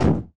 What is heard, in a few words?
Menu Close; Pirates Of Treasure Island